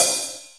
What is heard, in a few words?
open hh, House Side Of 2 Step; USB Soundscan vol.51; AKAI